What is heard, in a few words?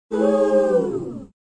falling ooh; VOCALS and SPOKEN WORD